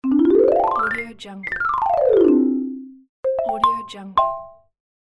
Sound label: marimba cartoon